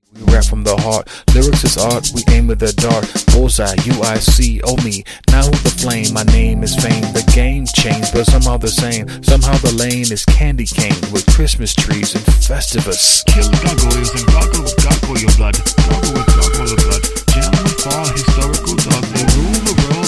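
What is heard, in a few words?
instrumental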